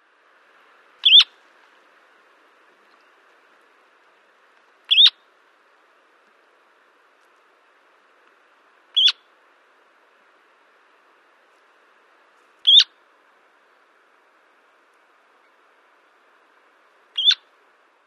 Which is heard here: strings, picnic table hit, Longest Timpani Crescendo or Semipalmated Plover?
Semipalmated Plover